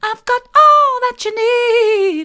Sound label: soft, vocal